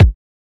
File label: 00s short reverb bass drum sample g sharp key